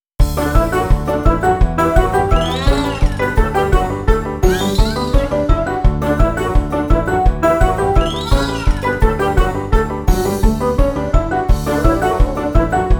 cheerful entertainment; by burak sound bank; cheerful vol 4

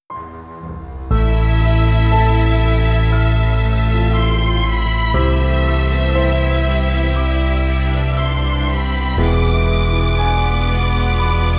dramatic cellos; By Burak Sound Bank

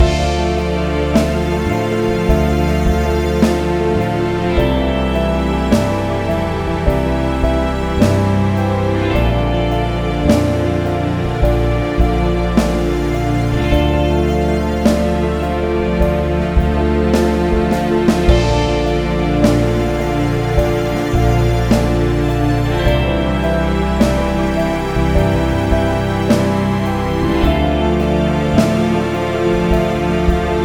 dramatic guitar drum comp; by burak sound bank; dramatic dram vol 17

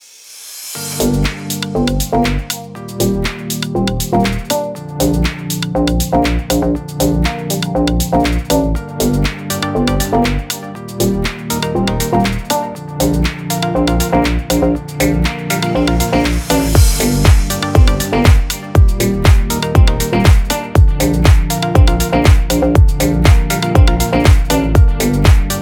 deephouse; by burak sound bank; deep house vol 2

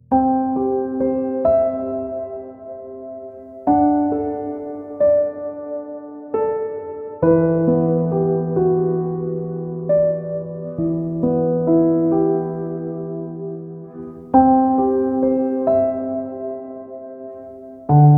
tension, horror excitement thriller song

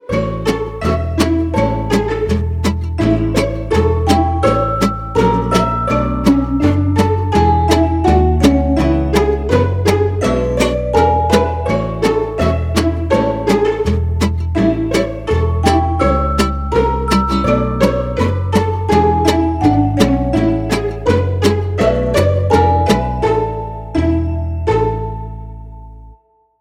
cheerful entertainment pizz; by burak sound bank; cheerful vol 16

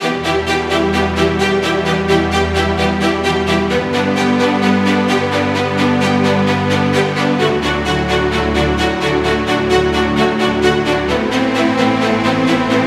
tension strings comp; gerilim; strings comp balansed; By Burak Sound Bank; tension gerilim vol 16

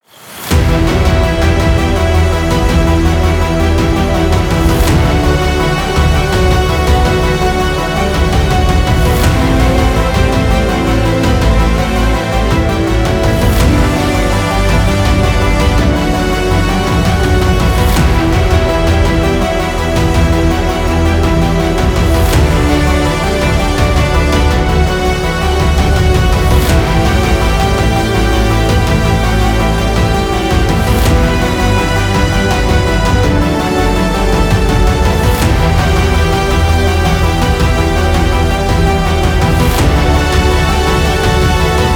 tension violin; By Burak Sound Bank; horror excitement thriller song

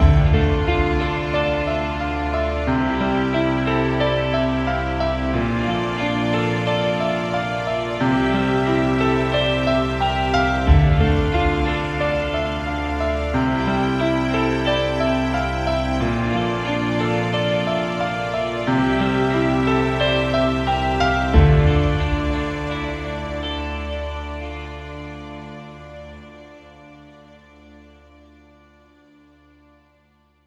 dramatic guitar; by burak sound bank; dramatic dram vol 18

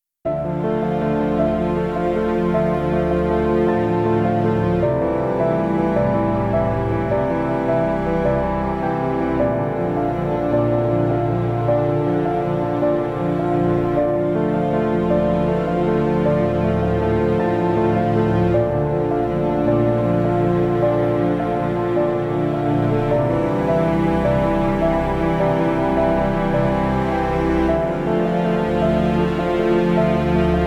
dramatic; by burak sound bank; dramatic dram vol 17

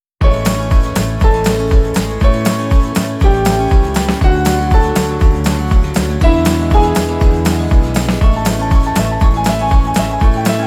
cheerful entertainment guitar; by burak sound bank; cheerful vol 16

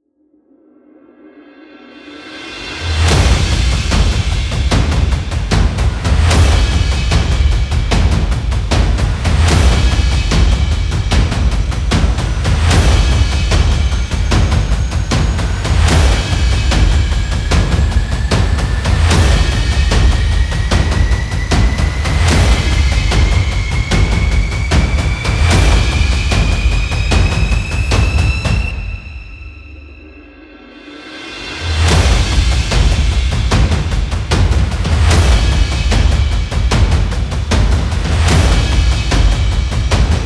dramatic drum; By Burak Sound Bank

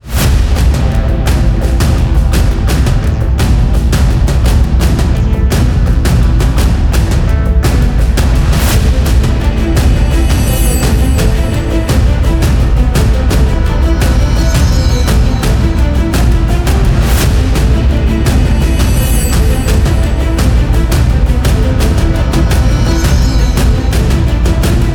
project tension violincomposition; by burak sound bank; tension gerilim vol 6

